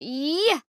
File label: Attack Medium